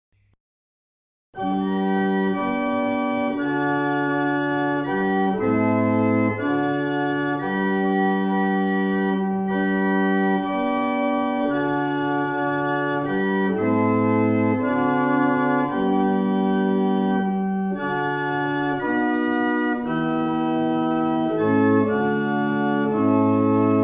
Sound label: German Hymn, barrel organ; Mattishall